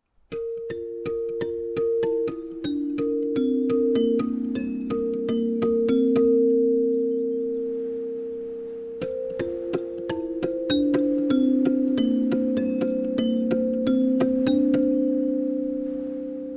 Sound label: xylophone wind piano